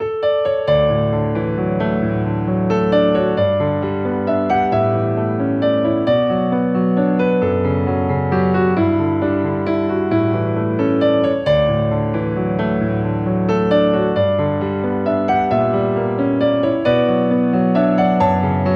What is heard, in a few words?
Piano Refreshing loop